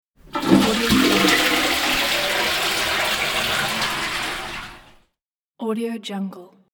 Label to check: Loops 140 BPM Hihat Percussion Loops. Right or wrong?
Wrong